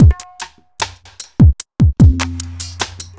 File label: evil beats 75